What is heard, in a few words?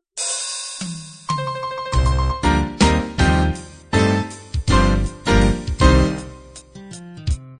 up swing intro